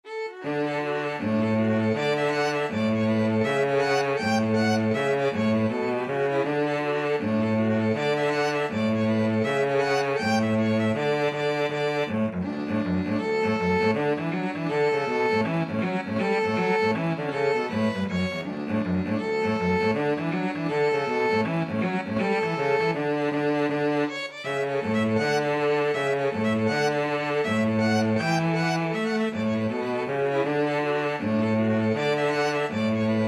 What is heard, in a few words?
violin cello duet